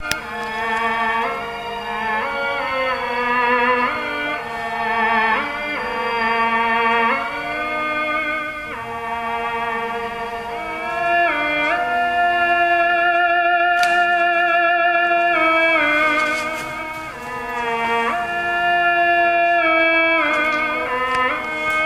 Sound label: theremin warm up